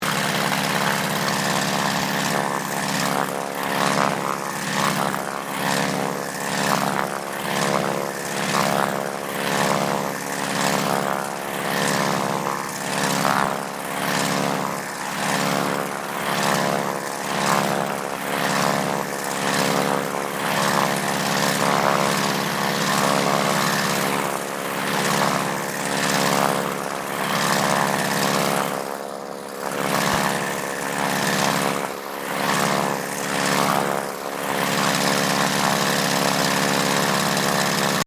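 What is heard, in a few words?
AIRCRAFT CA-28 CERES SIMULATED MANEUVERING; Airplanes (Sound Effects:Vehicles)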